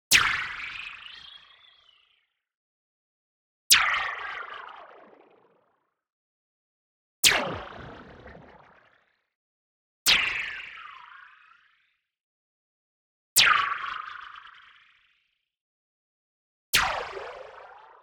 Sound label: zap, toned doppler impact; boom library; Anime Essentials; DSGNMisc